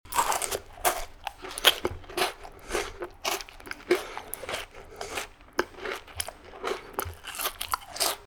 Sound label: eating biscuit